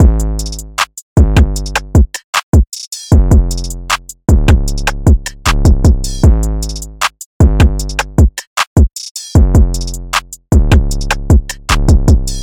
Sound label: 154 BPM Fm; Obliviate